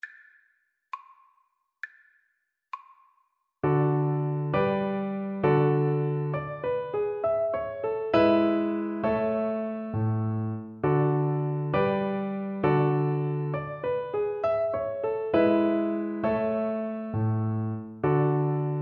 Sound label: G Major, Cello; Gigue